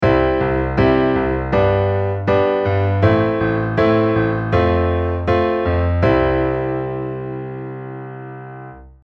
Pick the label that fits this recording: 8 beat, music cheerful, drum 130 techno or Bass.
8 beat